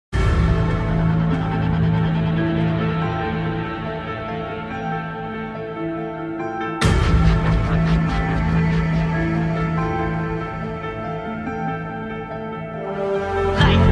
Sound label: rap song; Pubg